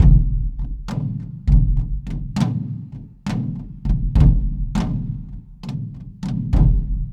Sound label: Java Lom Bak Drum Harmo, Ethnic Percussion; Sonic Foundry (Sony Creative Software); Ambient Atmospheres & Rhythms